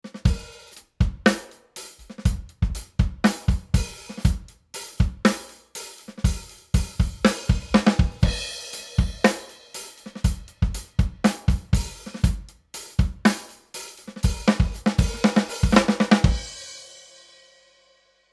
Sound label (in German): Drums ohne ONE LA; IGS Audio ONE LA 500